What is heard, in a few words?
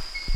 bonobo peep alarm